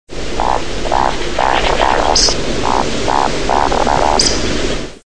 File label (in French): becasse cri; oiseaux; limicoles; parcours santé